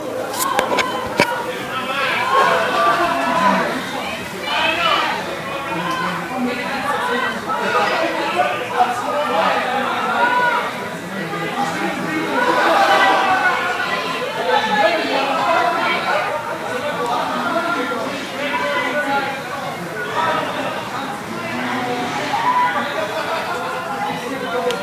noisy student party

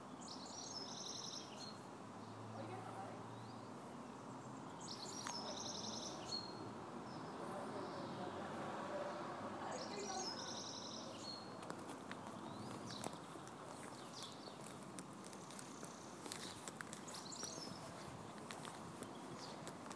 birdsong in the city

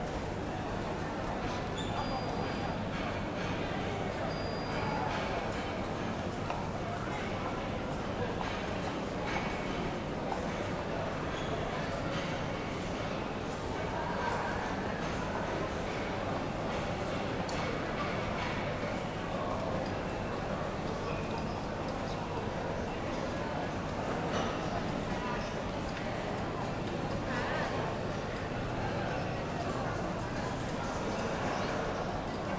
victoria station ambience

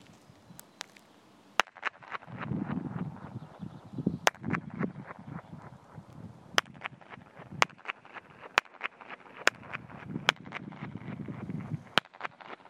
clap your hands

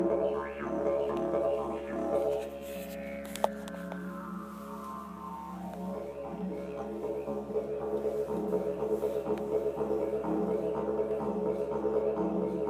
playing the didgeridoo in caves; ngilgi caves

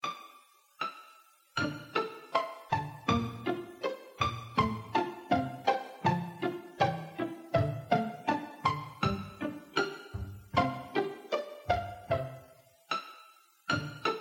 casio

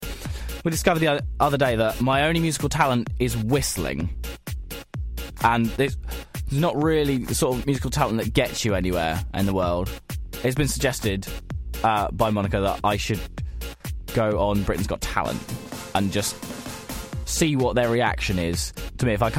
whistling

